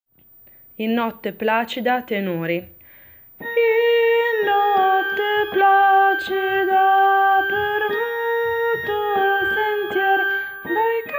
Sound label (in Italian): tenori; In notte placida